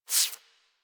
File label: foley idle lick furr; Warframe; Catbrow